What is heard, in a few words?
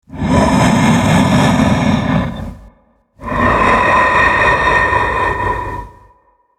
Colossus Breathing Idle